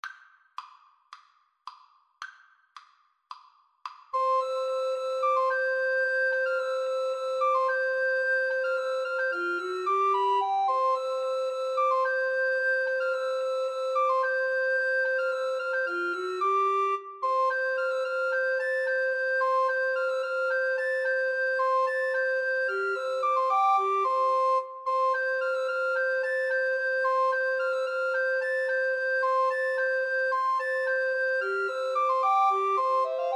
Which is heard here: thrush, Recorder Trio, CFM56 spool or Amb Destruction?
Recorder Trio